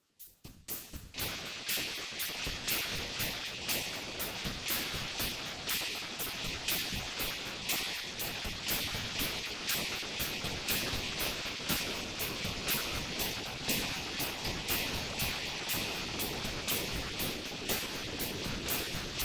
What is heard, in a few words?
ROCK RIFF Residual Noise